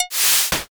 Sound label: fishing cast